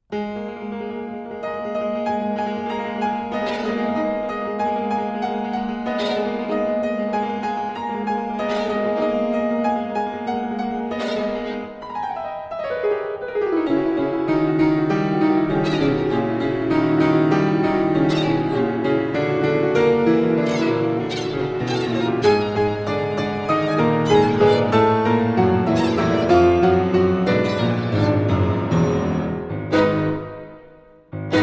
Violin Sonata in A Flat Minor, Allegretto

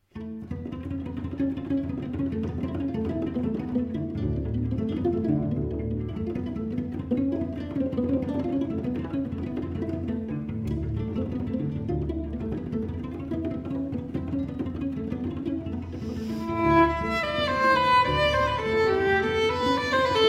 String Trio Allegro

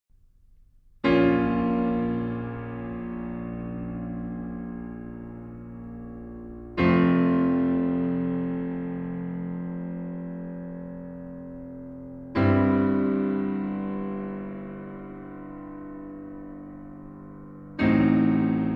Piano Trio Largo

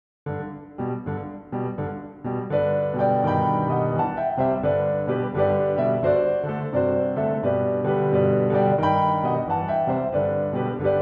Lucia Di Lammermoor, Arr. Solo Piano, Fantasia

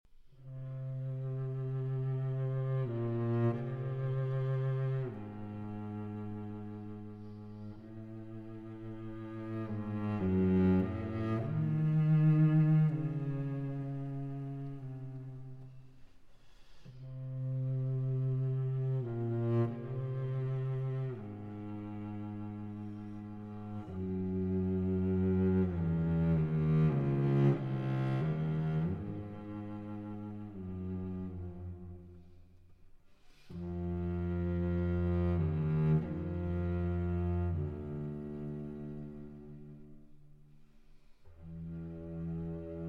Cello Concerto in E Flat Major, Cadenza, Live

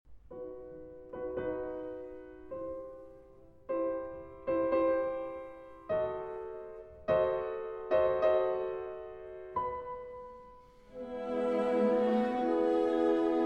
Piano Concerto in G Minor, Andante; Mendelssohn; Piano Concerto No. 1; Op. 25 II.

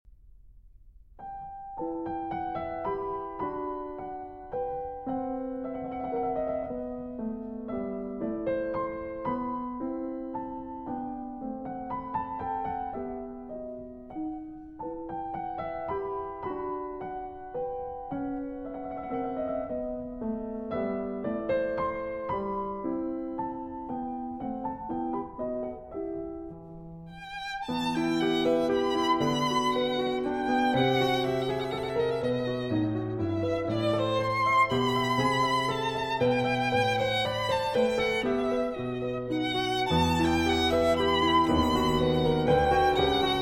Sonata For Piano and Violin in E Minor; K. 304; Mozart

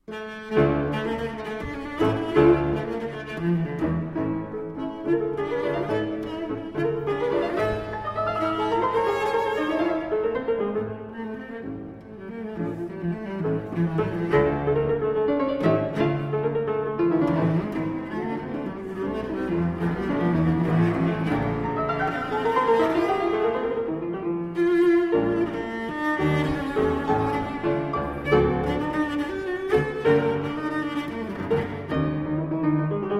Cello Sonata in G Minor Scherzo

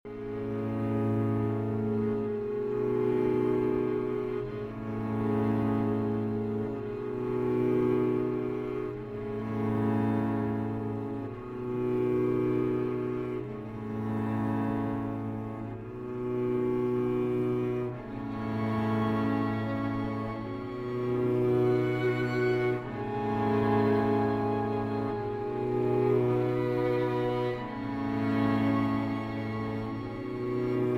String Quartet; No. 1 Pictures From Warsaw VI. Death

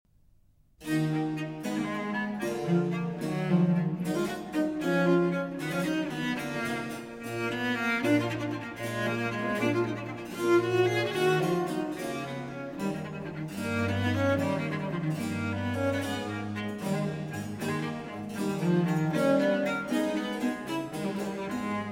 Sonata For Cello and Continuo in E minor; Vivaldi; R.40

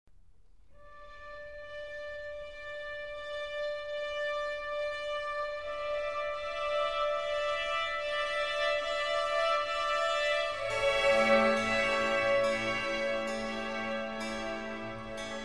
Oboe Concerto in D minor; BWV 1059r Movement 1

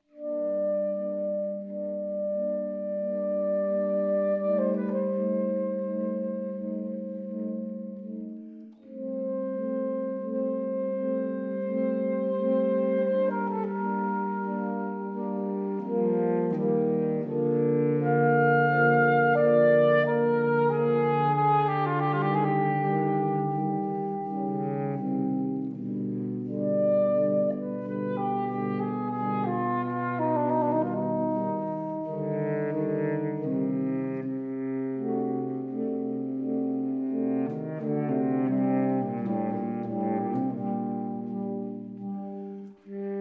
Saxophone Quartet, Air; Holberg Suite, Op. 40

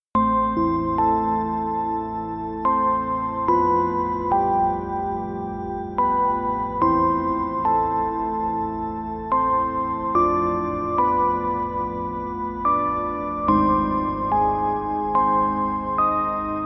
Ambient Space Music

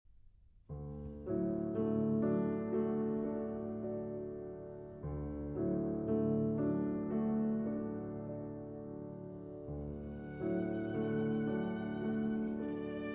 Méditation, Arr. For Cello and Piano; Massenet Thaïs, Act 2

